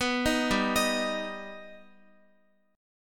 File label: G Augmented G Guitar; arpeggiated